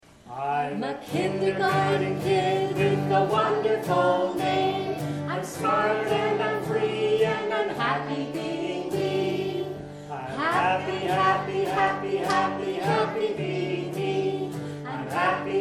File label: Classroom Recording